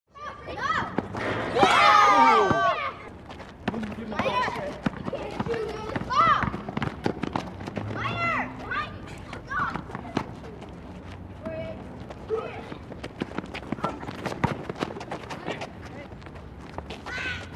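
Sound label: Playground Background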